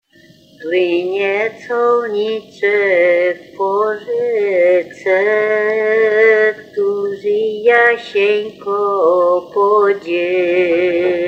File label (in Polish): Plynie czolnicek po rzece; Luszczow